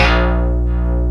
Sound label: PPG WAVE, OLD SYNTHS